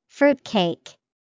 fruitcake

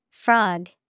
frog

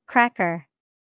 cracker

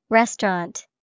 restaurant

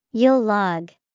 yule log

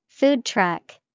food truck

